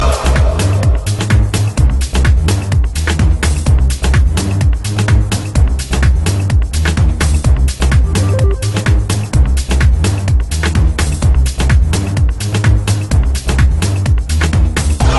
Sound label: Funky